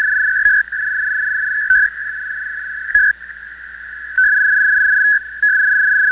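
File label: pactor 200, Packet and Teleprint Over Radio; PACKET 200 Baudot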